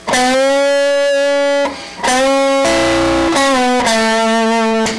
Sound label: guitar max gain, distortion box; Circuits